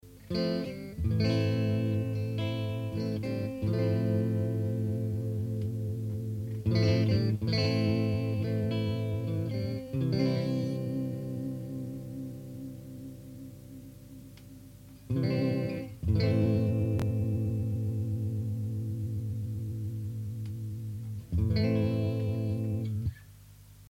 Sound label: cleanvibe; circuit demos